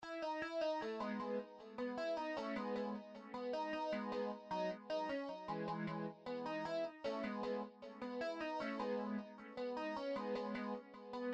Soukous Vintage

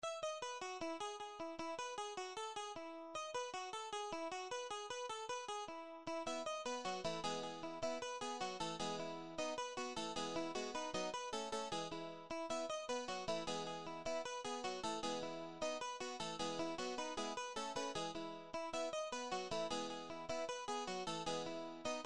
Soukous Clean

